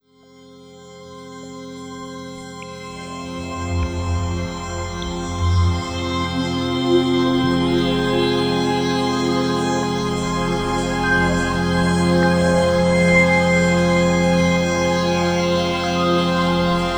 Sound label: Sustain Atmosphere Chords; Chords & Washes